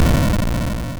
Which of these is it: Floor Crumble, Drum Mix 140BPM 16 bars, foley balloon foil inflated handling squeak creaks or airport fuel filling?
Floor Crumble